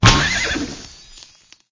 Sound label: Pinata Destroy; Clickergame